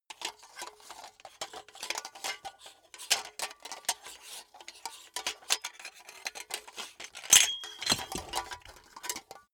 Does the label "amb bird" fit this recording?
No